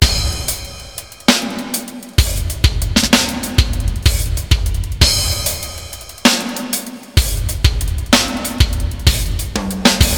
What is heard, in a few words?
verb test